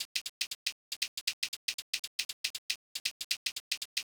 shaker loop 118 bpm; drums; loops; bespoke - Floral v.2

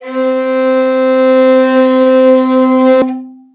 Violin C; Instruments; 7rystan's 700+ FREE COLOSSAL EDM SAMPLE PACK

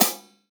Hi-hat; Percussion; 7rystan's 600+ FREE EDM SAMPLES & DRUMS

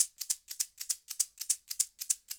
shaker swung 100 bpm; percussion loops; bespoke - Floral v.1

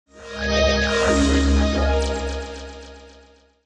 melodic fx digital doodad Ab Maj; base pack

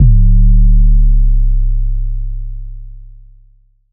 Bass

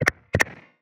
Synth

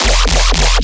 bass Bwap E 150; Ganimed; base pack

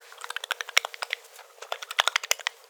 Gross; SFX; 7rystan's 700+ FREE COLOSSAL EDM SAMPLE PACK

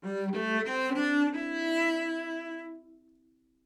live string phrases, string phrase Cmaj 100bpm

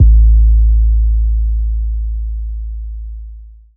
Trap bass, EDM

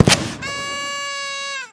Lunchbox; SFX; 7rystan's 700+ FREE COLOSSAL EDM SAMPLE PACK

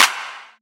Clap; 7rystan's 600+ FREE EDM SAMPLES & DRUMS; Percussion

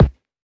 kick; bespoke - Floral v.1; drum oneshots

